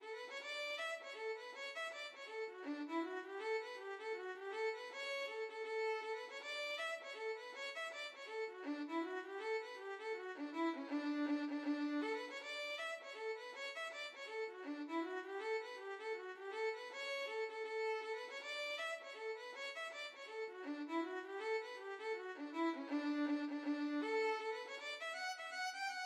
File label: sea captain violin